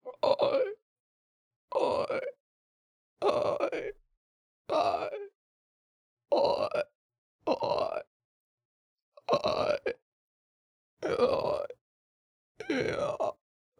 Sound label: creaker idle